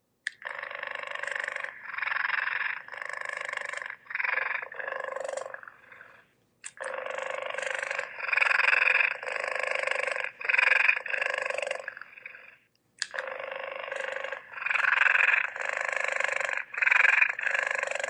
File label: cheetah purr; chewbaaka